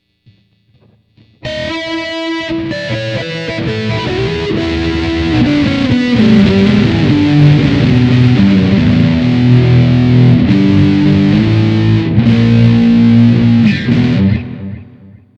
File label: FAT STEREO LEAD, Boss GT-8